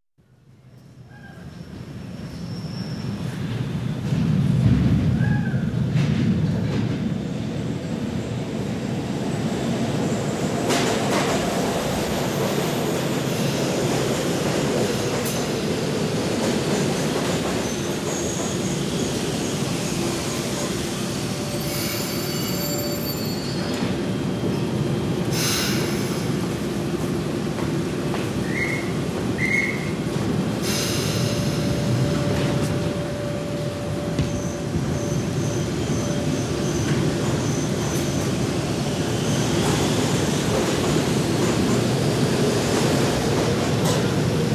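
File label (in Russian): звук поезда метро на станции прибывает и отходит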